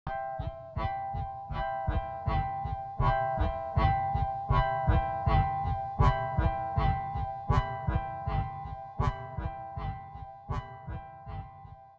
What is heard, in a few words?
ambient